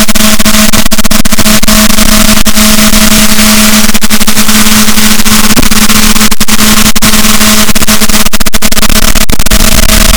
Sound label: rorquals, Balaenoptera Physalus, boat